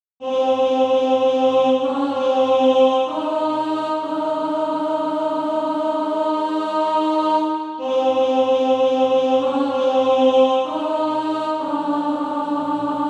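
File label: alt, Practise Music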